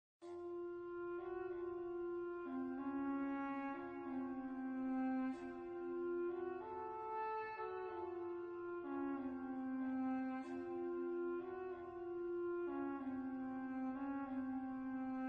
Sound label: ancient Egyptian music